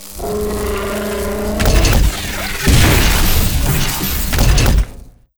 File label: boss dreadnought main; steamer crazy